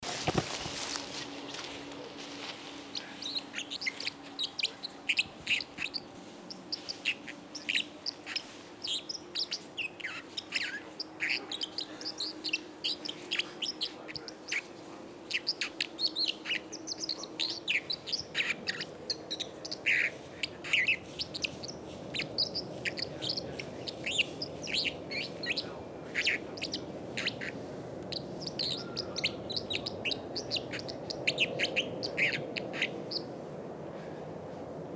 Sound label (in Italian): Merlo Acquaiolo